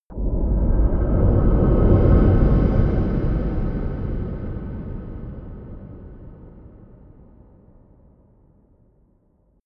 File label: whoosh rumble